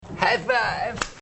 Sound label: high five